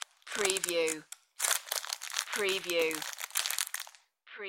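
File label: Plastic Wrapper Crinkle; cookie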